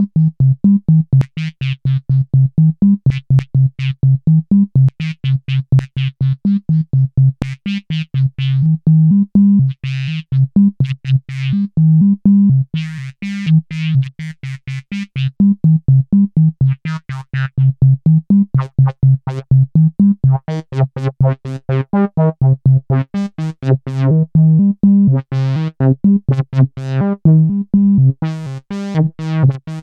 Voice Filter Envelope

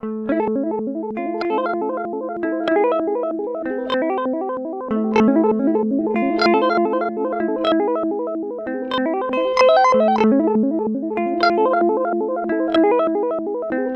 Alexander Pedals Radical Delay Arp

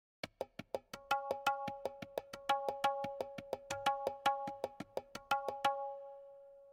Tabla

